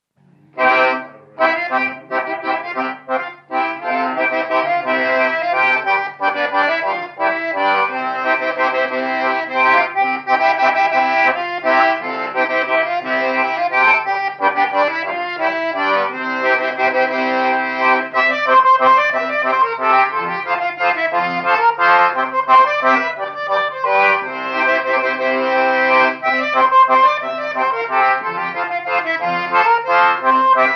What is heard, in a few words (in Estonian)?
Vana valss, loots, folk